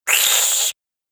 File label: Nasty Little Monster